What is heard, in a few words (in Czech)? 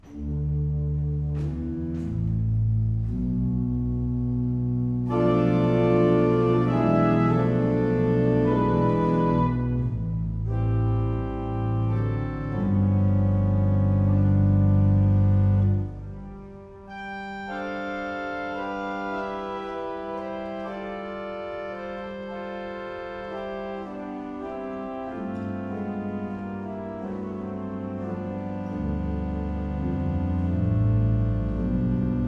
fantasia c dur; forster